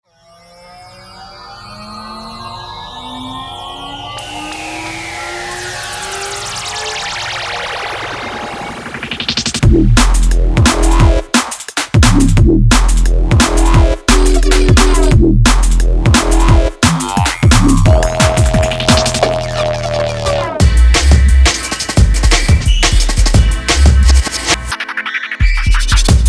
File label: Drum Bass; Drum Bass Archives Vol 1